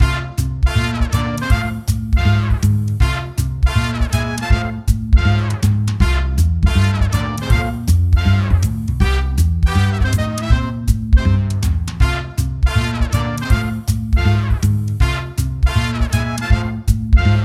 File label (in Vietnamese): Nhac nen mo dau video nhac jazz